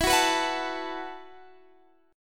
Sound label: B 7th Suspended 4th E